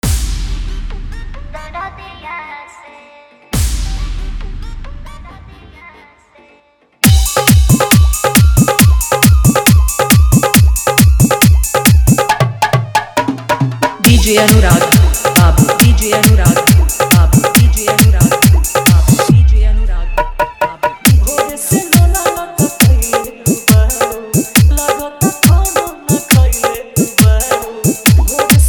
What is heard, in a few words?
Hard Dhollki Mix